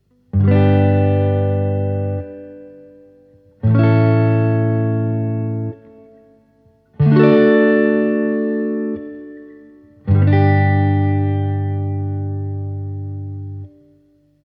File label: tele channel 1 reverb, super champ x; fender super champ x; réverbe